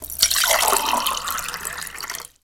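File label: POURING WATER; Greytsounds; HOUSEHOLD FX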